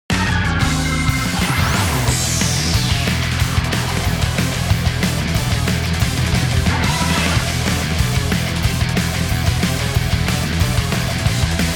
Fortress Boss BGM Arrangement; SMB3

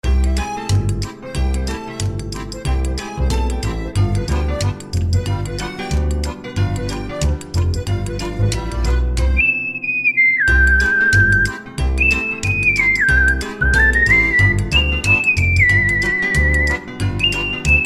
Checkpoint; DKCTF; Sawmill Thrill

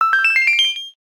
1-Up; MLPIT

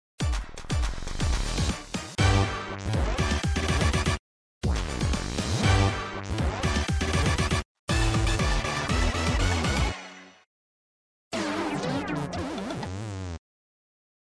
Dancing Team Jingles; Wario Ware Gold

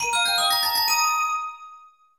Red Coin, Sound effect; SMG

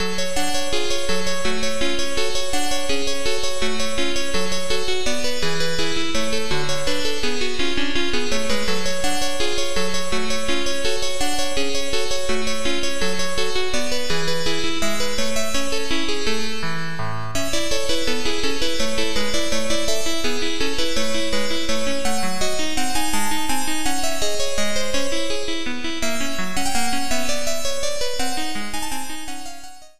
Tetris Critical Music

